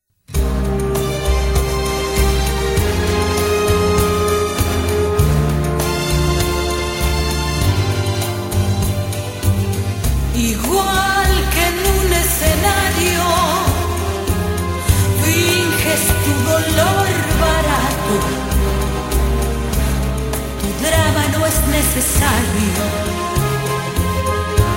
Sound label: rumba Medley